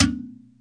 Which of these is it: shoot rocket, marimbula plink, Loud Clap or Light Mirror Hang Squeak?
marimbula plink